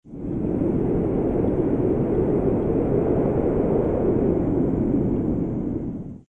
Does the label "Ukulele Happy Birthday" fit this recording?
No